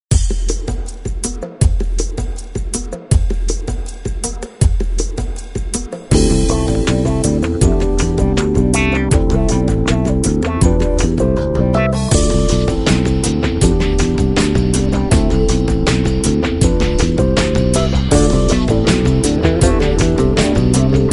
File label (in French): drumatisme, wah wah